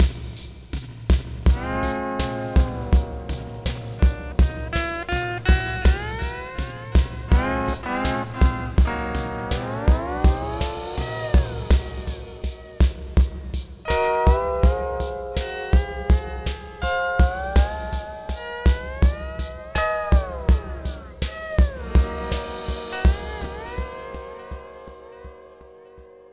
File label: Sunrise Full A#, Lounge; Zero-G Kocktail Kollection; Construction Kitz (Lounge); Sunrise Mixes 082